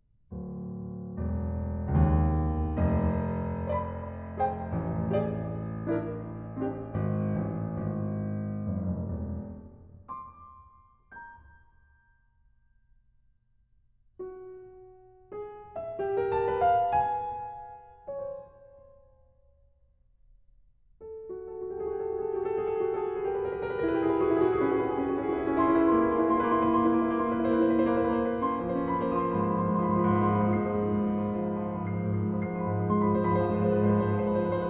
Tango, Solo Piano

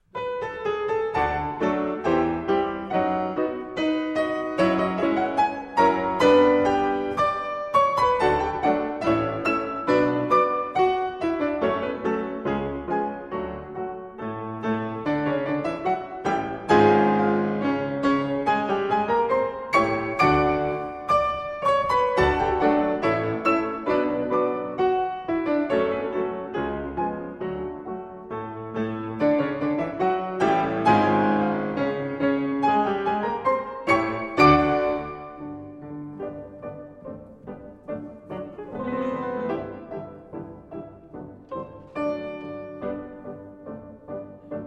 Symphony in D Major, Gavotte, Live; Prokofiev; Arr. Terashima; Symphony No. 1; Op. 25 Classical III.

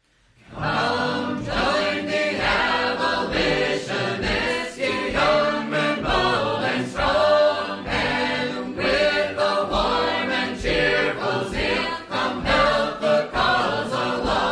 Sacred Harp Singers From Western Massachusetts

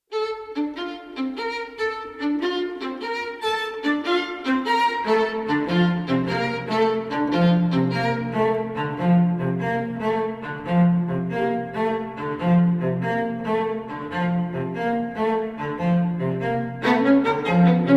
String Quartet; No. 1, Rørsla II. Dansur

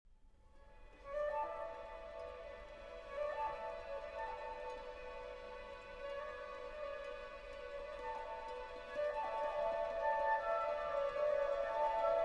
Violin and Orchestra

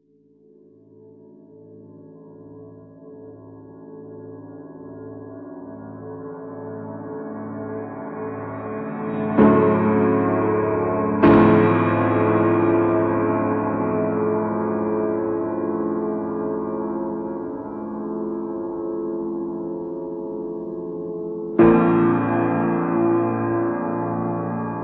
Folk Aesthetic Version

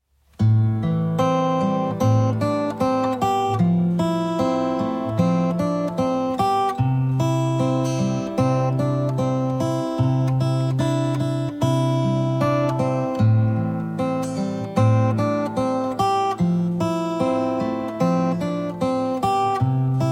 (Arr. For Guitar)

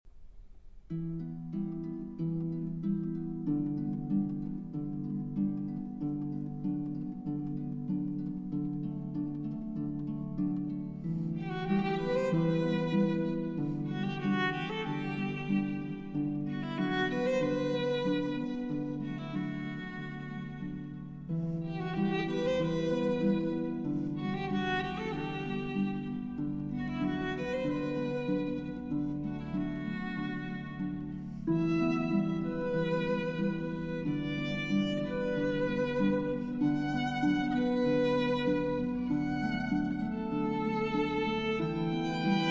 Violin, Harp & Orchestra

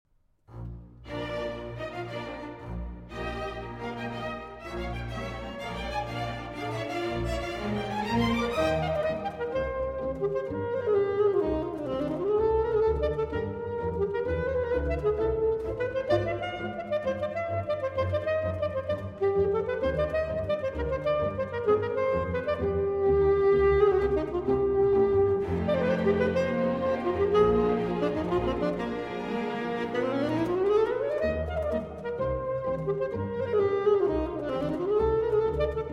saxophone concerto

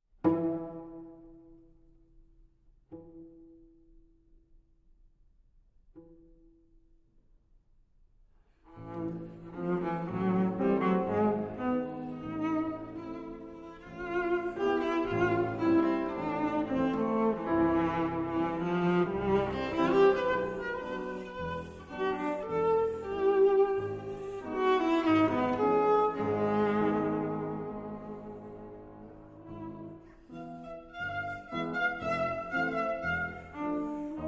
Dance of the Girls with Lilies, for Cello; Romeo and Juliet, Op. 64 49.; Arr. Vlasova for Cello and Piano

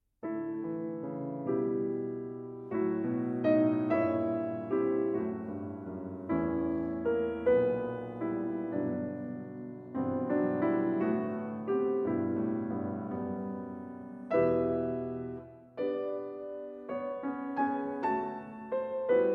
Piano Suite in E Major IV. Theme & Variations

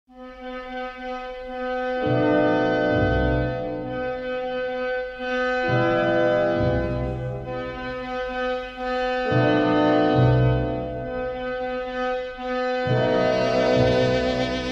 Havanaise in E Major for Violin and Orchestra

